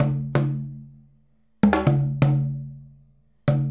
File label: Djembe; Sonic Foundry (Sony Creative Software); Essential Percussion; Drums